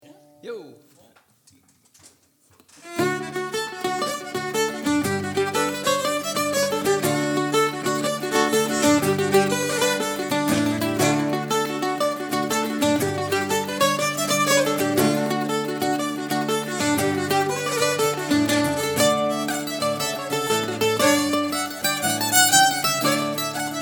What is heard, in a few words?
Irish Pub Sessions